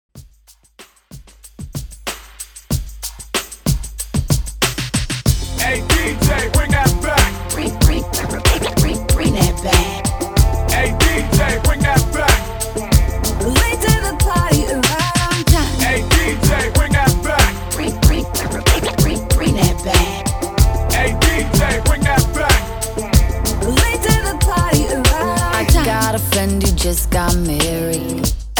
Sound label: HYPE REDRUM, 94 BPM